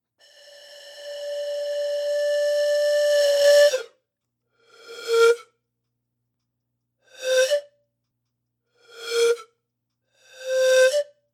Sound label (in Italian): flauto pan; syrinx